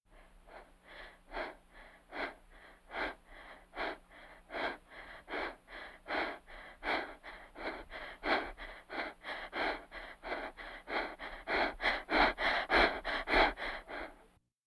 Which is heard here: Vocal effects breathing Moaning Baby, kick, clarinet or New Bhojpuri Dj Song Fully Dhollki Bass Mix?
Vocal effects breathing Moaning Baby